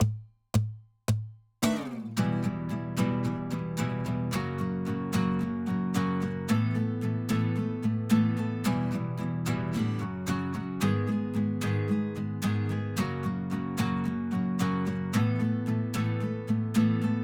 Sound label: maxxbass